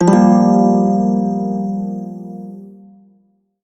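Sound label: Prime Shawzin Minor Chord, Music; Warframe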